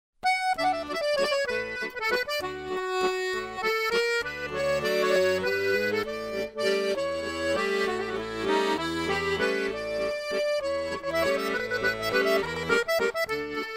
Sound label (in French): Valse Musette